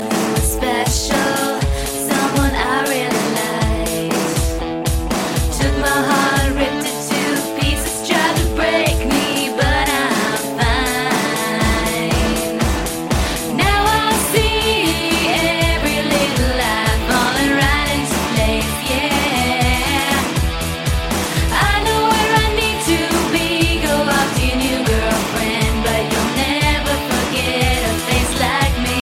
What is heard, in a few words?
cool ringtone